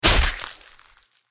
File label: panther attack contact up